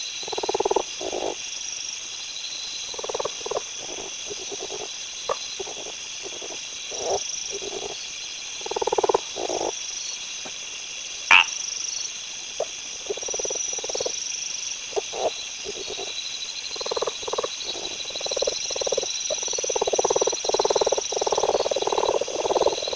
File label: Lithobates brownorum; ranidae